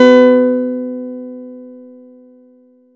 Chord; ModelCycles

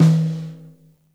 rack tom - snares on; JazzFunkKit

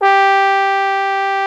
TROMB G3, MONO BRASS; Greytsounds VOL-1

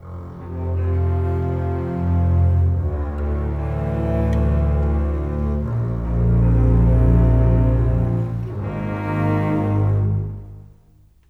Bass Cello; Sonic Foundry (Sony Creative Software); Orchestral Series VOL-4; Rock and Pop

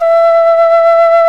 KENA E2; World Combo

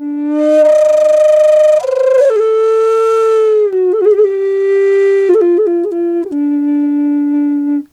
D wild flute

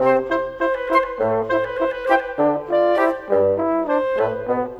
Brass Winds; Cinematic; Orchestral; Sonic Foundry (Sony Creative Software); Orchestral Series VOL-3 - Cinematic

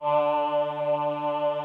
VP CHOIR, VOCODER; Greytsounds VOL-1

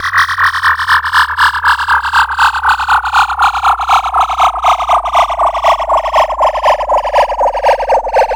FX SWEEP, SYNTHSWEEPS

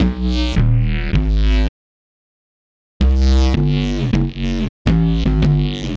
Swoop Bass, Drum N Bass

